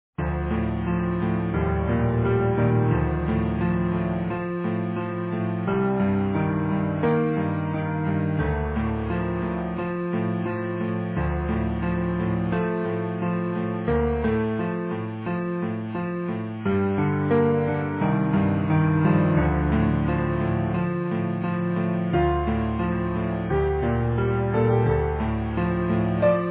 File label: Easy Piano